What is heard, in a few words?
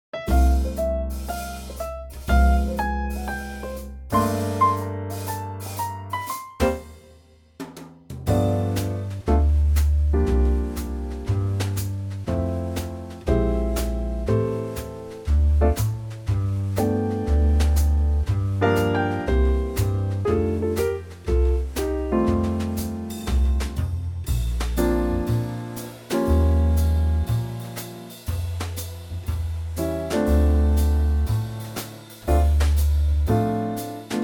trio F